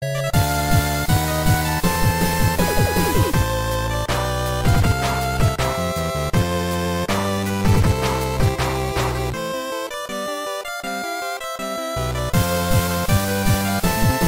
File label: 8bit